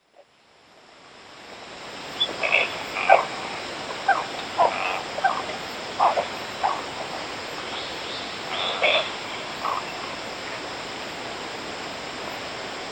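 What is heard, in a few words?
cattle egret; アマサギ; 沖縄県与那国島; 簗川堅治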